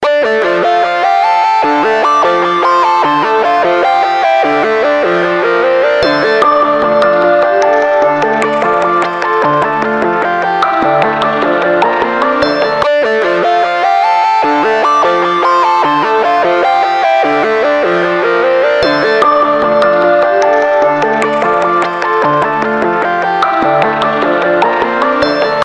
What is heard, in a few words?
frozen samsung note 8